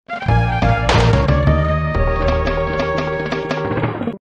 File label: death theme mashup; donkey kong country 3